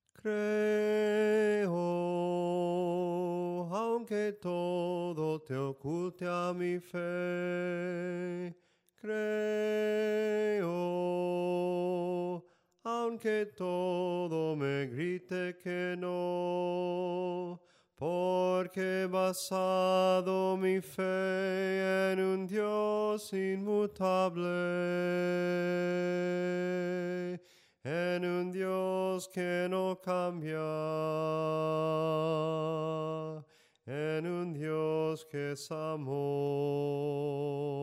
SOPRANO CANTADO; cantos del camino

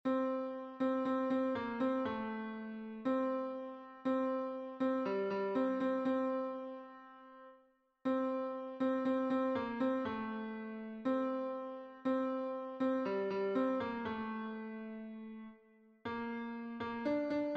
TENOR, himnos en piano; LOS PIES DE JESUCRISTO; cantos del camino

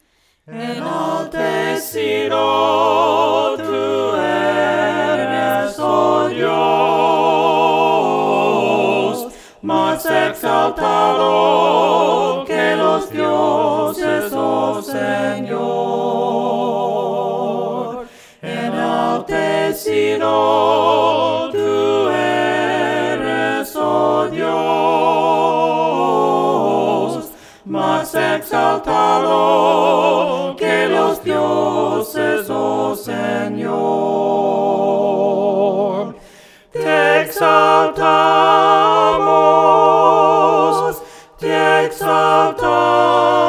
VOCES, himnos a capella; cantos del camino

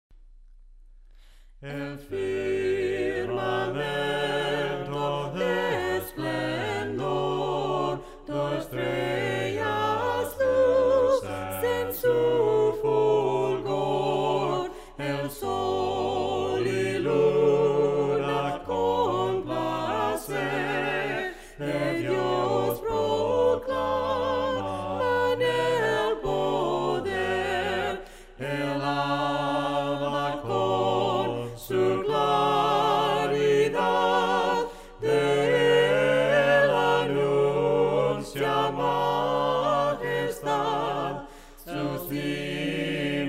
VOCES, himnos a capella; cantos del camino; EL FIRMAMENTO DE ESPLENDOR

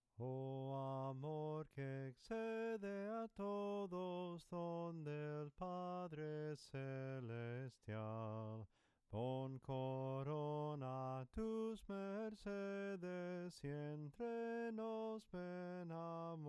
OH AMOR QUE EXCEDE A TODOS, himnos en melodia soprano; cantos del camino

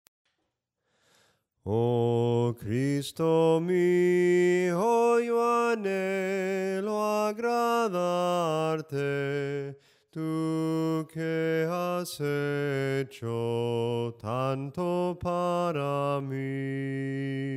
SOPRANO CANTADO, himnos; OH CRISTO MIO

